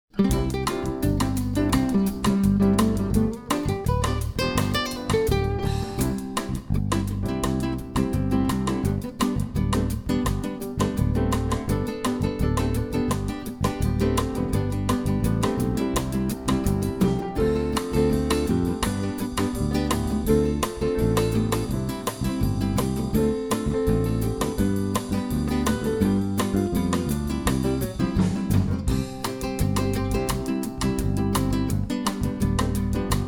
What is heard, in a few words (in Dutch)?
handen samba zonder zang